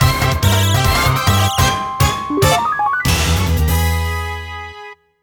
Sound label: Jingle Rank Up; Tottoko Hamtaro Nazo Nazo Q Kumo no Ue no Hatena Jou; NDS